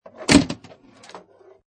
Pinball Sound Ka Chunk With Following Movements; Video Game Sound Effects

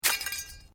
Metal Light Movement Minor; Materials

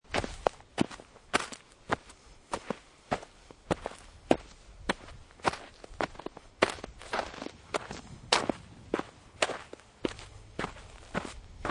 Snow And Ice Footsteps Snow Hard Packed; Weather

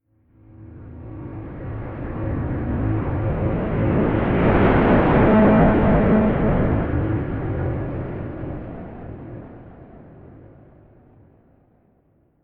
Whoosh SciFi Space Vehicle Mothership Overhead; Whoosh Space Vehicle Large Mother Ship Overhead; Spacecrafts

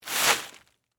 Plastic Tarp Pull, Foley, Short, Movement; Plastic Sound Effects; BlastWave

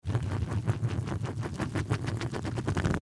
Tape Spin; Office; Blastwave

